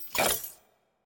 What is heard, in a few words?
rooster Turn; eggomatic